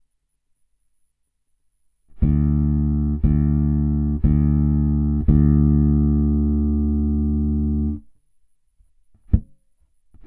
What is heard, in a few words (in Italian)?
Suonare il basso ghost note